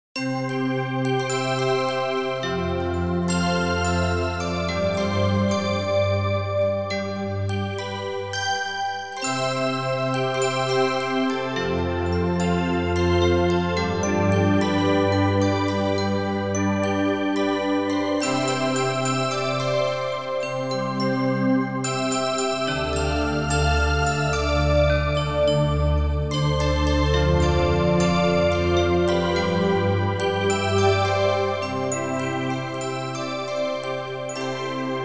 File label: Bell Dx 7 Pad